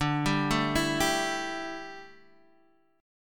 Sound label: D Suspended 2nd Suspended 4th, Guitar, Standard; arpeggiated